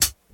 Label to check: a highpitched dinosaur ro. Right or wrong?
Wrong